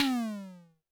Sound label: TD-20 Tom B, Roland TD-20 Toms